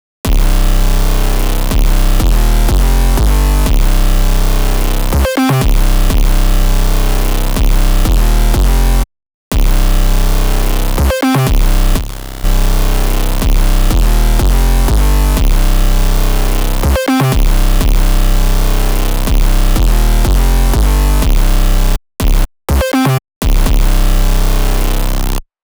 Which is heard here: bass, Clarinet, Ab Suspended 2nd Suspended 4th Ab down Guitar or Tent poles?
bass